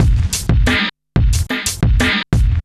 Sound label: Street Tip, R&B Drums